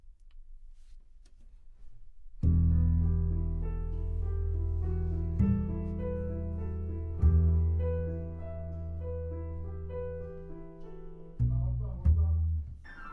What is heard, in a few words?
C Bass Bot